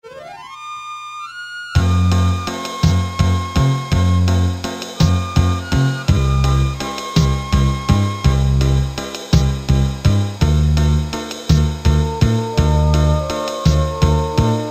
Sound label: slow rock, Casio MT-500 Rhythms